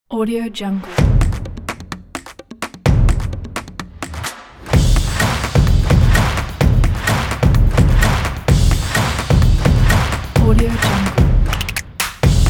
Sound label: the percussion